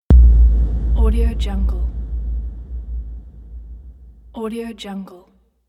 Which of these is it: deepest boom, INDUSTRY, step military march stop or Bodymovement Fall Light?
deepest boom